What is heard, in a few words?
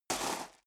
step sneaker gravel medium slide short; schritte